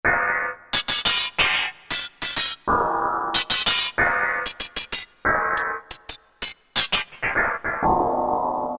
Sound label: Nails; Roland U-220; Factory Timbres